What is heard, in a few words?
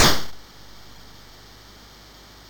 sid MOS6581R2, GAMES; Face Off